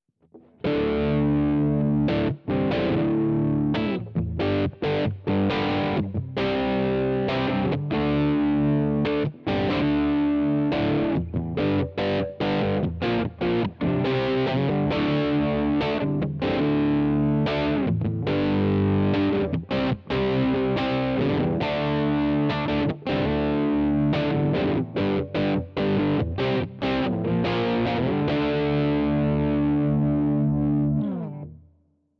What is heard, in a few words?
Crunch Bridge HB